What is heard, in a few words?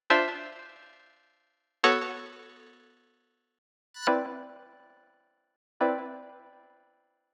chord pad